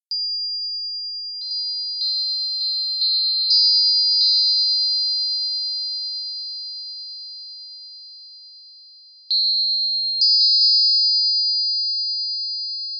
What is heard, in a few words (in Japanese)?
furin trio